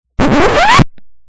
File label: record scratch; made with Voicemod